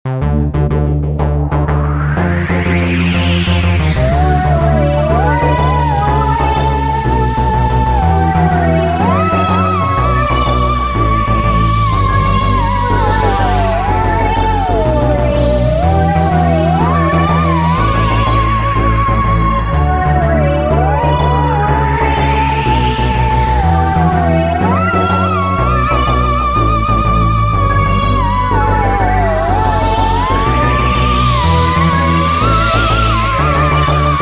Theremin version in the style of the BBC Radiophonic Workshop